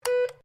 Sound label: MORSE CODE T; Morse code aplphabete; Morse Code (Sound Effects:Electronics)